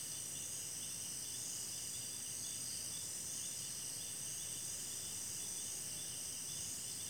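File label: shoots jungle loop; ambient; nature; Counter-Strike Global Offensive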